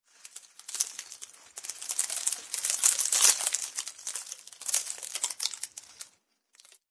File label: random leaves blowby; random amb sounds